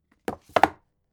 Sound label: book shelve hard